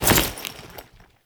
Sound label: foley step; Warframe; Characters; Kahl